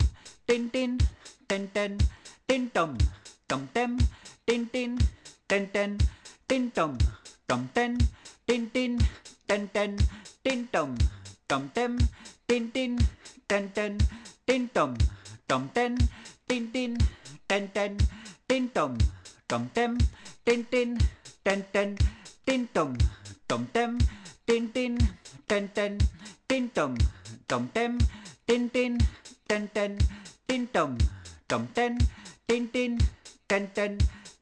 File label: dum